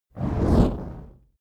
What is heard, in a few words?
fire whoosh torch med flange